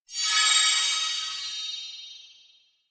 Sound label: pose beauty; awayuki; anemoi